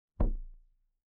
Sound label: impact wood knock